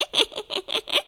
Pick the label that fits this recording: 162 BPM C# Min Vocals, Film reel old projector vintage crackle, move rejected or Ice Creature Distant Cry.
move rejected